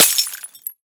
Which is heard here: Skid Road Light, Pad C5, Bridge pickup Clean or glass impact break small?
glass impact break small